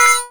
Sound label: Button; x Jalak KI